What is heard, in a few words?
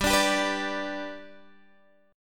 G Major, Guitar, Drop D; chordshape; down